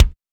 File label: BONUS BD, Drums